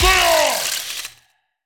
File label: yell; HeavenStudioPlus; ringside